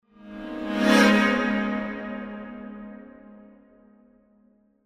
Symphonic Hit Short Show Up Chords

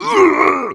male die; firefight

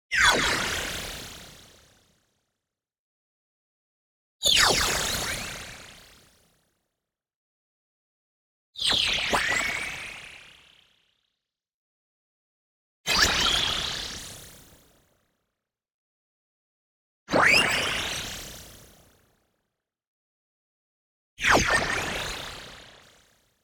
movement fast, tone bend frequency; boom library; Anime Essentials; DSGNMisc

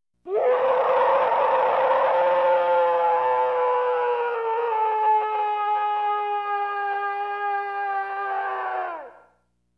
Monster Roar, Scary Sounds; 1001 Sound Effects; Halloween Horror Sounds Effects

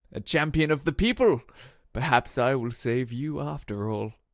Lose; Pitch Black Audio Pong

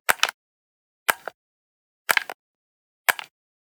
Generic Button Wood Small; boom library; The Interface

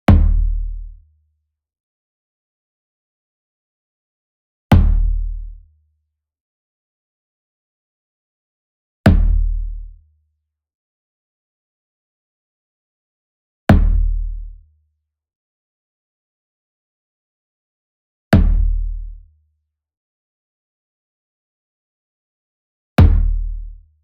SMALL DRUM Fontonfrom Hit Hard Beater Near; boom library; Cinematic Strikes Construction Kit

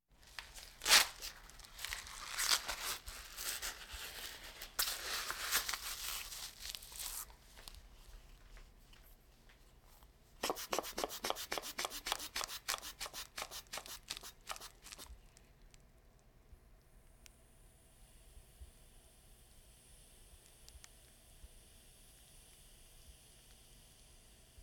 Hospital effects

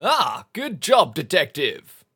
success; Pitch Black - A Detective's Demise

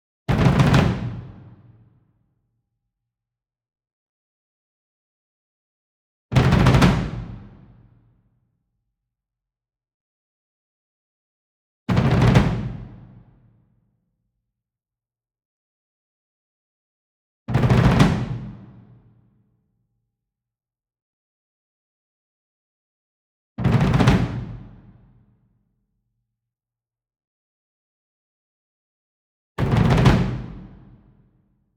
ENSEMBLE DRUM Bass Drum Marching Roll Medium; boom library; Cinematic Strikes Construction Kit

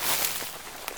grass step, footsteps

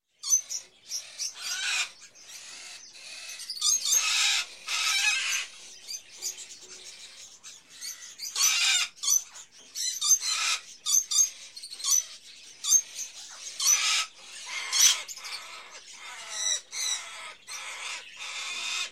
Birds, Various Birds In A Pet Shop, Ambience; Sound Ideas; Series 2000

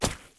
boots mud; Apotheorasis

